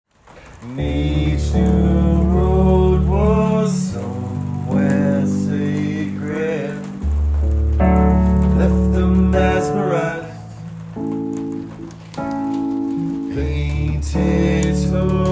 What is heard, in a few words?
In D Rain and keys